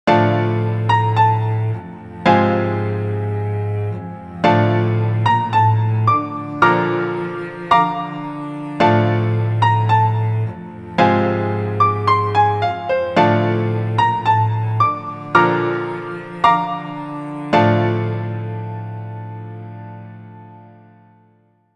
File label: Intro Music